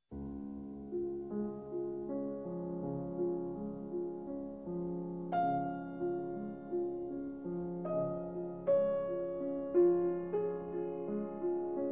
Nocturnes, Lento sostenuto in D-Flat Major

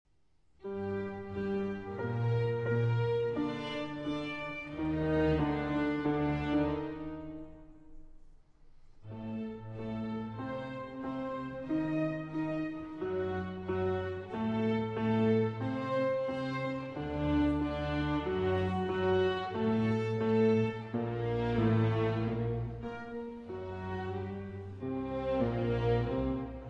Keyboard Concerto in D Minor, Adagio; BWV 1052 II.

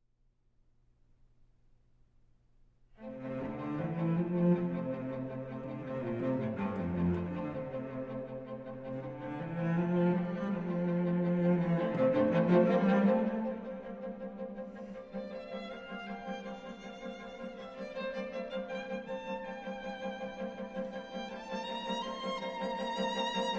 String Quartet in F Major, Allegro

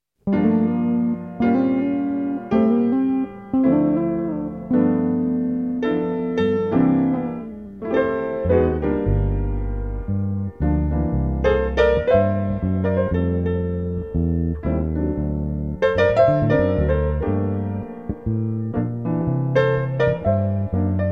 Jazz Band Piano Blues

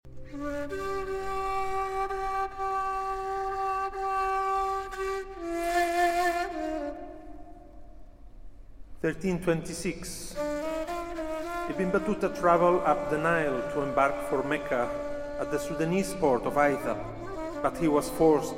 Taqsim and Narration

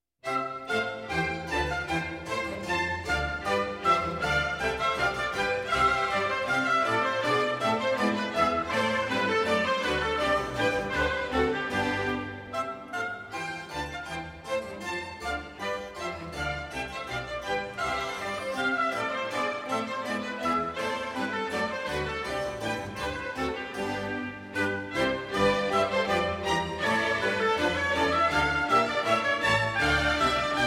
orchestral suite, gavote; No. 1 in C major, BWV 1066

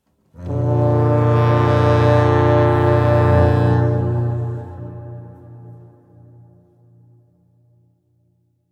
B Flat Major Prelude

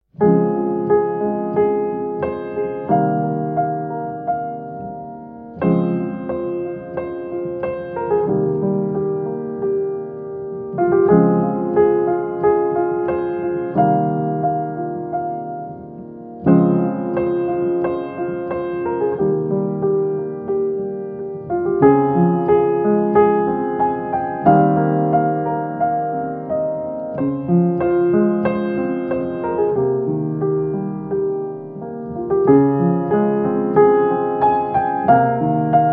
Relaxing Piano Music

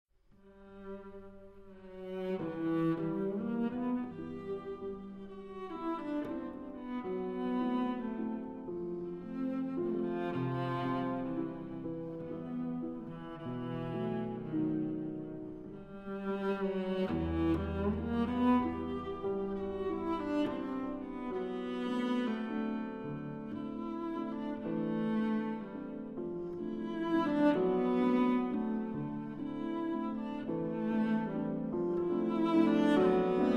Valse Sentimentale, For Cello and Piano

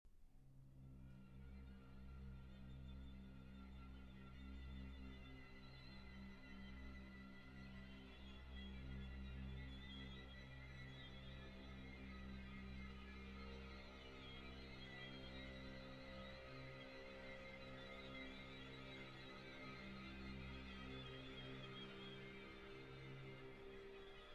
Symphony Orchestra; III. Dolcissimo

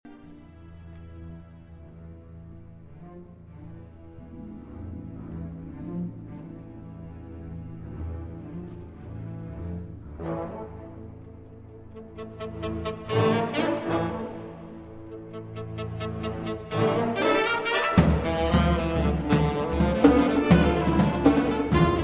Alto Saxophone, Percussion and Orchestra; Double Concerto

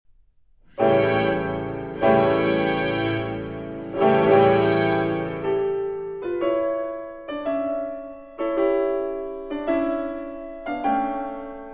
Concerto For 4 Pianos in A Minor; Bach, JS; II. Largo

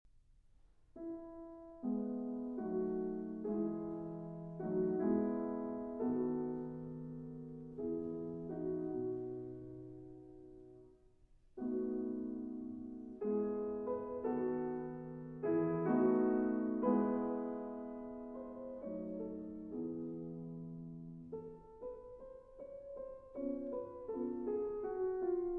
Piano Trio in G Major, Largo Con Espressione

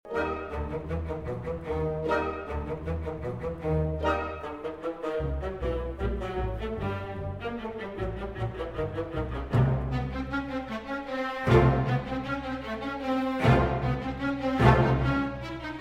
Trumpet Concerto in A-Flat Major Tempo I - Coda

